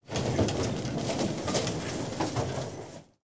rocket reload

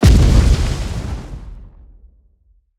impacts; World of Tanks

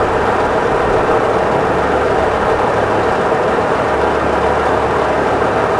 industry; ambient; World of Tanks Blitz; map amigosville

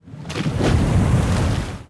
submarines engines; World Of Warships

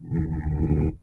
Cows Grunt; Civ4 warlords; Resouces and Improvements

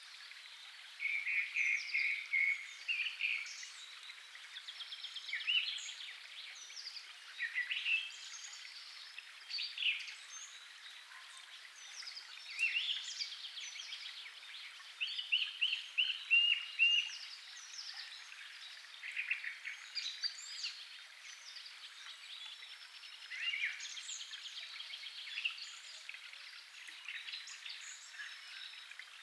ambient; World of Tanks

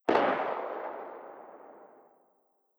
eoka pistol attack far distant; Rust

